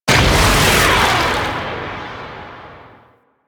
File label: Pirate Cannon Blast; Warframe; Warframes